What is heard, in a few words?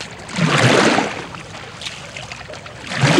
ROW, Boat Rowing